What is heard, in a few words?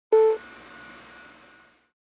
Short Beep on running computer